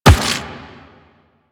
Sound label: Run Rock, Footsteps; Warframe; The New War; Kahl; Quests